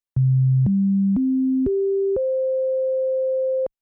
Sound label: special sound